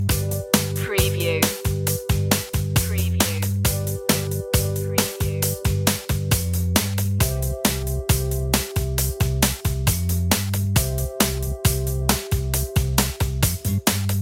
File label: gentle beat loop